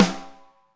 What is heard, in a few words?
GROOVY, SNARES; Back In Time Records; Big Bang